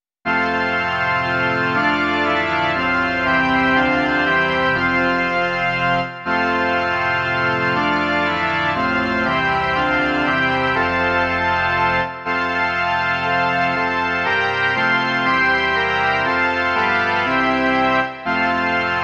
varhany

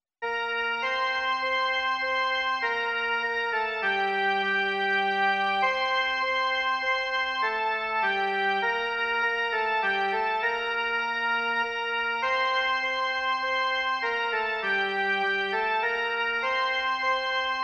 jen melodie; Hospodine, pomiluj nás

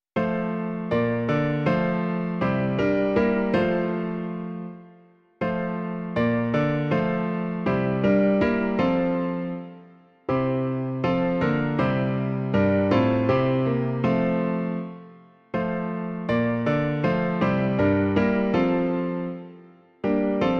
klavír; Již Babylon hříchu klesá; Klavírní verze

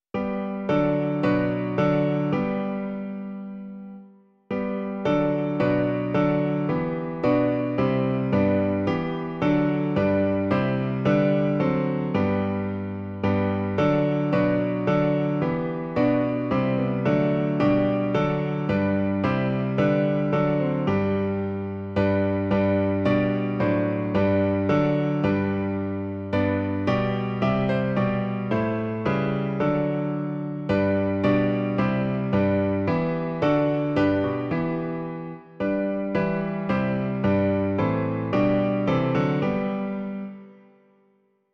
Amen, Otče, rač to dáti - klavír; Klavírní verze

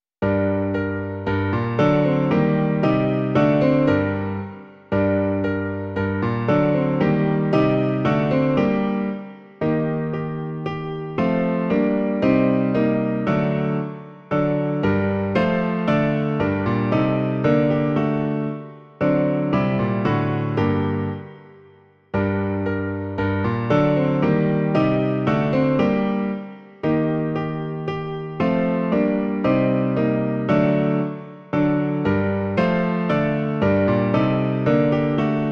Pojďte, bratří, pojďte spolu - klavír; Klavírní verze

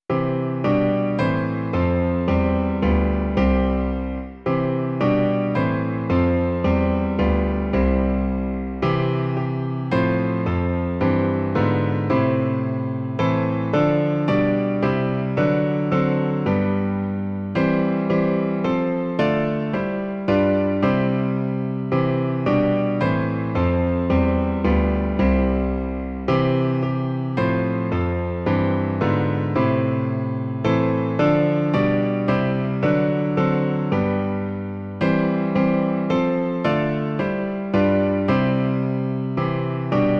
klavír; Klavírní verze

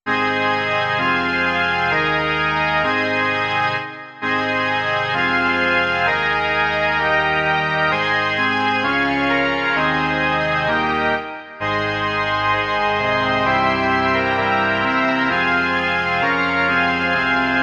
varhany

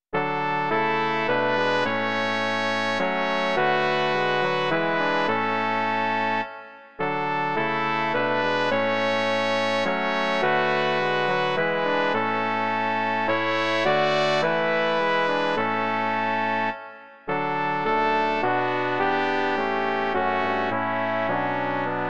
kvarteto trombónů; Bohu čest, sláva nejvyšší; Imaginativní verze

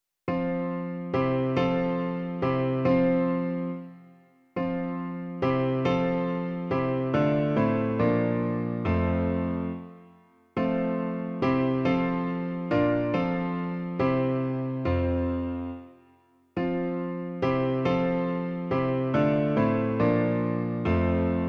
klavír, Klavírní verze; Sláva buď tobě, Bože náš

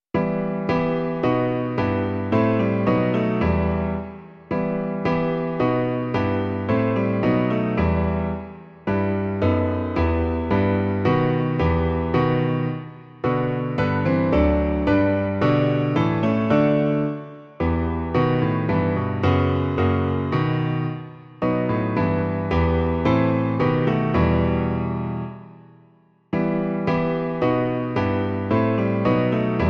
klavír; Klavírní verze

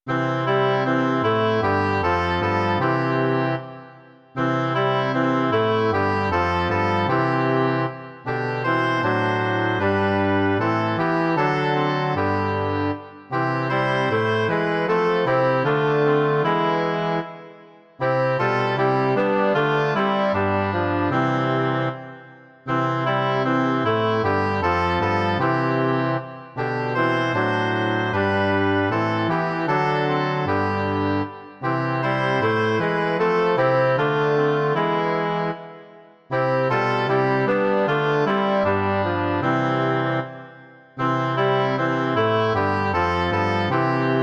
hoboj, anglický roh, fagot a tuba; Imaginativní verze

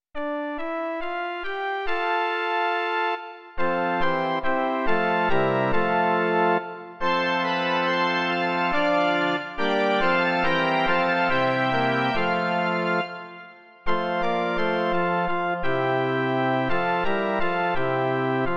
Mužská verze; Doprovody Farského liturgie

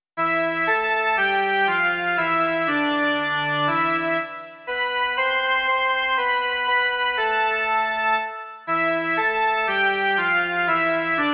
jen melodie; Ó požehnej nám, Pane

